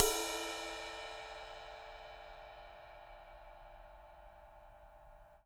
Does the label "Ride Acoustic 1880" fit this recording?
Yes